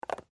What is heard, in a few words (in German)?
Tupperbox